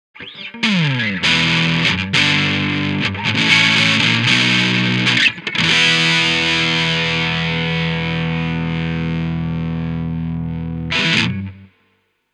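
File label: Black Lion Audio Auteur Quad Guitar Amp SM 57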